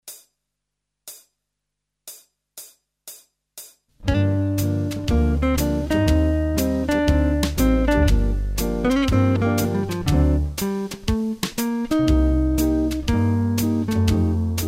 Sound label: Jazz melGt; MyVirtualBand